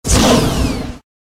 Tiger

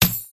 fur tree attack contact mid

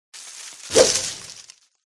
forest spirit attack voice mid

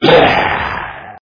fishman attack

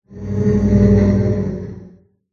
crab buff

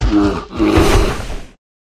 White Bear

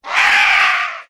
witch wound

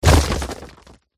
defender attack contact middle

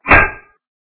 block weapon

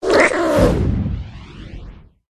cat attack voice

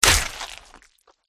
shankara attack contact bottom

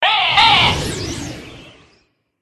raven attack

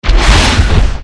Dragon Dodge

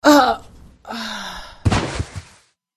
bot human female death

